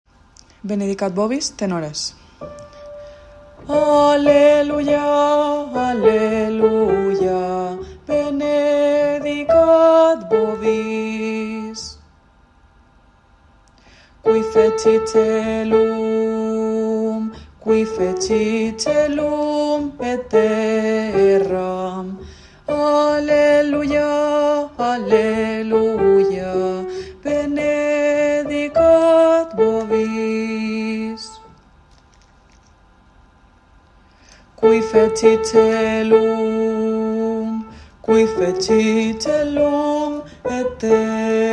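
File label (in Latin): benedicat tenor; Benedicat vobis; Georg Friedrich Haendel; Navidad JOCSMAB 2023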